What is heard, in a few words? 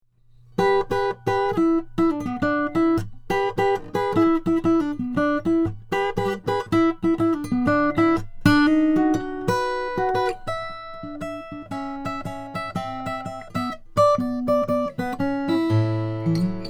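froggy bottom p12 deluxe mahogany, guitar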